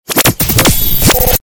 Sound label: Galery Noise; Sound Ideas - Galery Noise 001